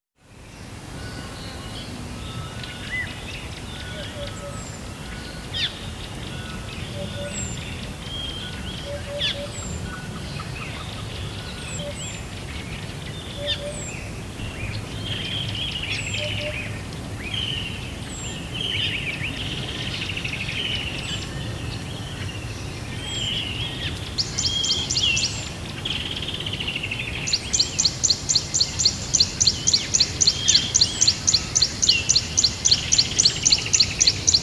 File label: ambient birds; KriptoFX; WaterSystem